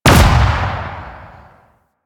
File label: Note Projectile Hit; Warframe; Bard Quest; Bard Boss Fight